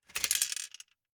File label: Gumball machine, metal, glass, candy, switch, mechanism, rotate, dropping, quick, hyper; Eiravaein Works; Vaeyan IV